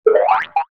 Cartoon Poppyup Appearances

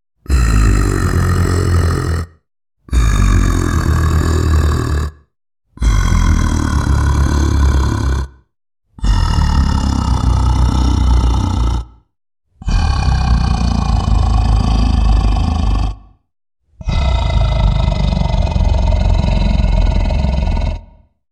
Growl Variants Compressed; Pyr0x

